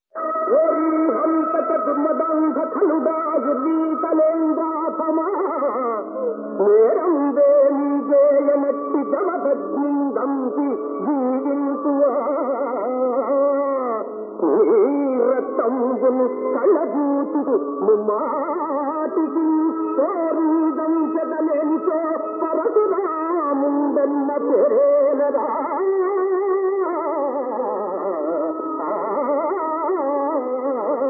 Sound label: Padyam; RenukadeviMahatmyam(1960)